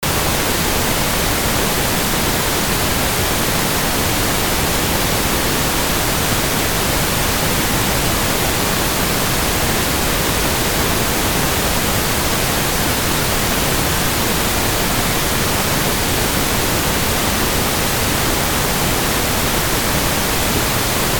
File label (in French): bruit rose